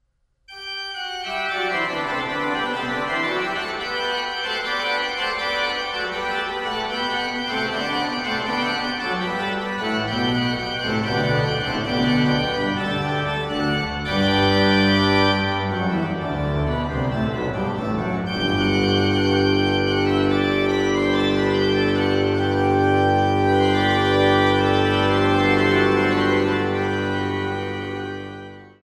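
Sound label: orgue; Nikolaus Bruhns; Praeludium in G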